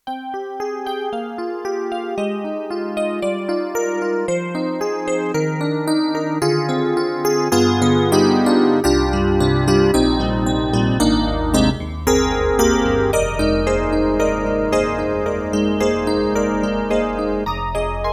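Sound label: YAMAHA MU1000EX Bright DX Detuned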